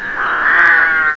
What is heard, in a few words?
ROOKS; ANIMALS; CMI Sound Library Rev 1.3